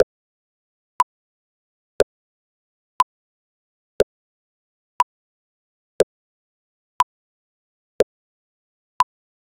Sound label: short lofi, pet